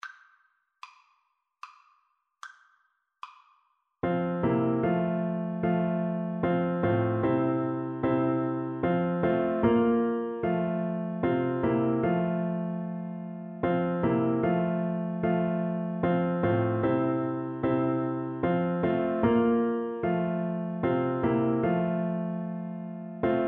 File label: Bass Clarinet